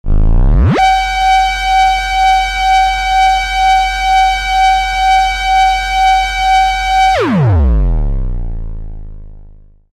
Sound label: forcefield